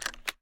Lock

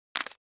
shutter mirror up

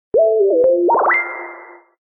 message, notifications; Condor; C8

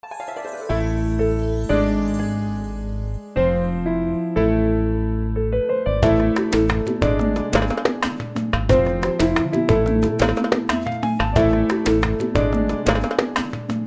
Arabic Lounge; LG

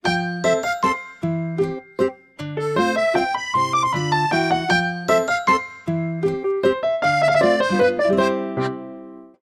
Amusement Park, ringtones

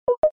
open; LG; SU780 Lollipop 3

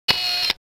Mech. Sound Zoom Out; SKY IM-S610K; Camera

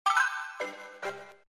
SMS tone; Huawei G6600 (MTS 635)

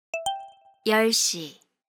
Hourly Alert; SKY IM-U680L

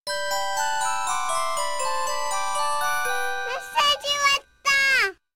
baby voice, message notify

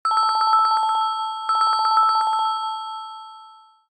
Classic Bell, ringtones; Samsung Galaxy M31

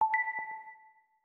eclipse unlock; LG G Flex

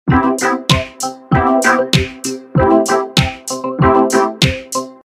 Happy dancing

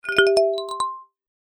call mail; HTC; Infobar-A02

